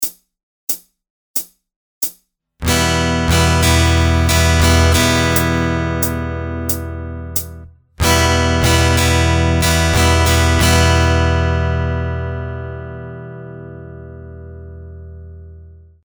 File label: Variations of the Ultimate Strumming Pattern SLOW, guitar; Ultimate guide to strumming book